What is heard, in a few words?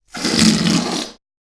big raspy fart